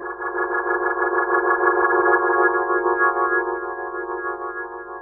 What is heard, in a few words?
Keys; Houseworx